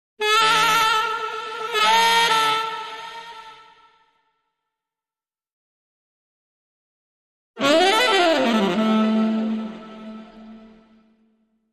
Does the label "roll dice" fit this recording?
No